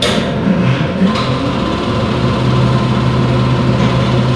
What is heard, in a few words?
machine moving loop; Half Life Model Pack; Half Life 2; ambient levels